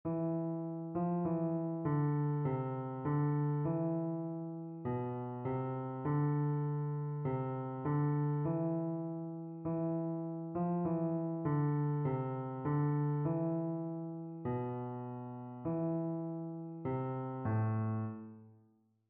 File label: mode identification